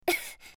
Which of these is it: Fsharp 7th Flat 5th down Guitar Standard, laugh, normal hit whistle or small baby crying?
laugh